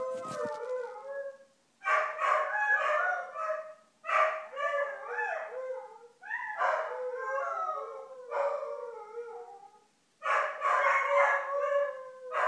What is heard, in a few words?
puppies howling